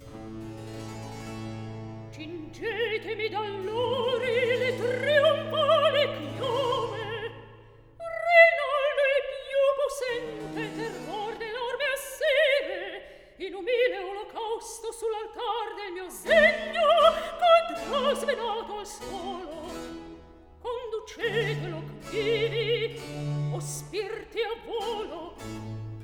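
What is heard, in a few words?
Recitativo, Handel opera; Act 2; Cingetemi d'allori; Handel - Rinaldo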